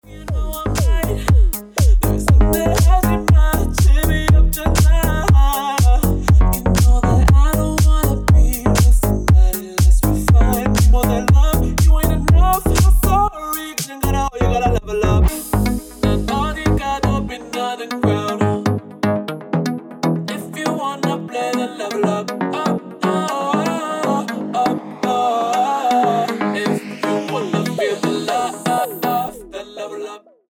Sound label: Original Mix Ringtone